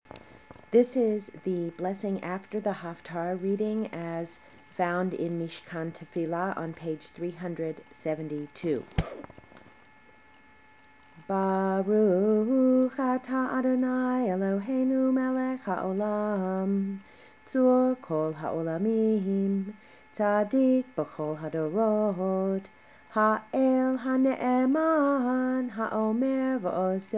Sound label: Haftarah blessing after short version